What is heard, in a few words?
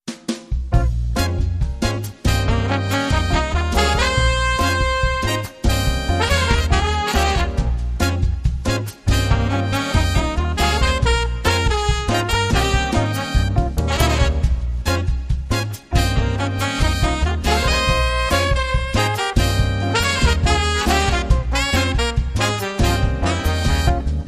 Latin Brass